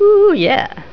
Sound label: ooo Yeah